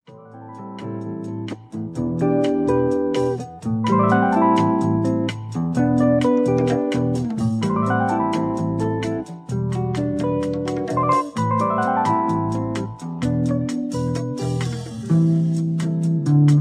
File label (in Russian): gitara